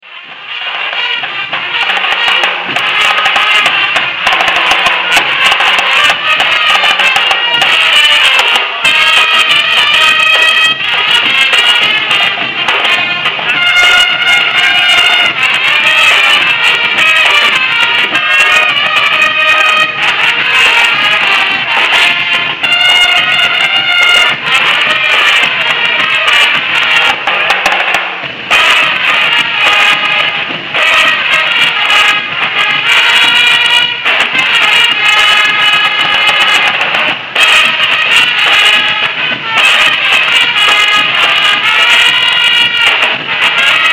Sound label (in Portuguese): fanfarra banda fanfarra